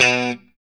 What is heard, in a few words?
POP B 2, STRAT guitar; Spectrasonics; Hans Zimmer Guitars VOL-1; JC-STRAT POP